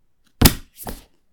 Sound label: Book Drop